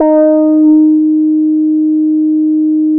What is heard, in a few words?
talk, D#4, Boom da Bass; Boom da Bass 3; E-MU Producer Series Vol. 6 – Analogia Project